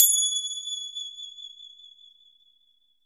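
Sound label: amb bell, ambient percs; Univers Sons; Basicussions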